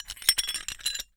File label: Hole Saw Rattle, Hole Saw Bits